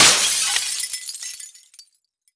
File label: break glass phys large; physics; breaking